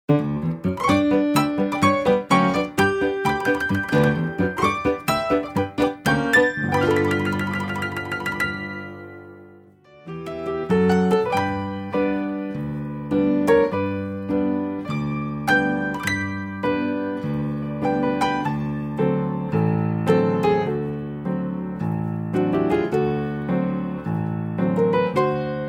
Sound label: pantomime backing tracks